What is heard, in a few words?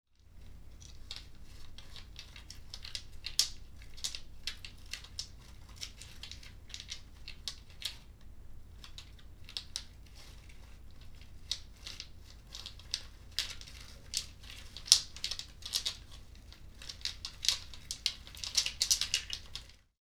Percussion sound; Ben Duinker